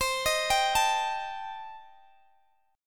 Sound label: C Minor 6th Guitar Open G, chord